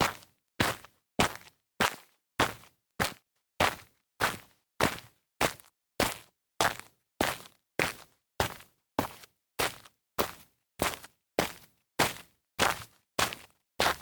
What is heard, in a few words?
walk soil